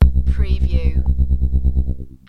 Plasma Door

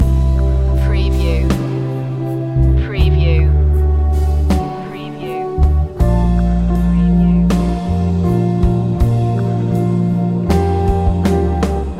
delay drops loop

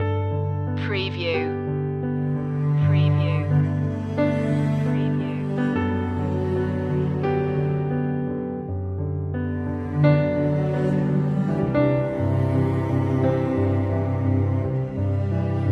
until we meet again loop

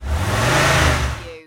Truck Ford F150 Rev Engine Perspective

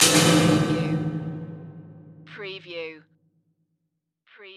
Hit Metal

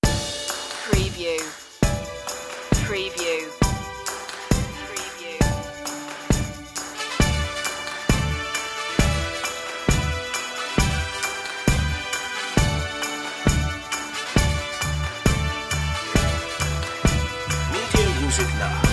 instrumental

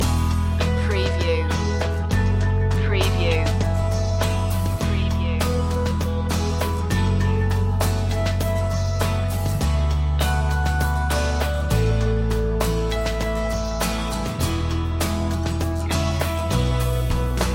carefree loop

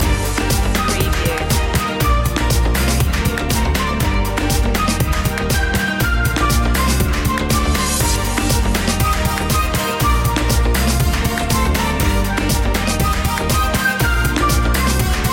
latin groove loop end; media music now

